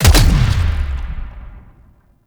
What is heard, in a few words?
Infested Shotgun Alt Fire Punch; Weapons